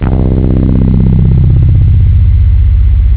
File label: juno, synth bass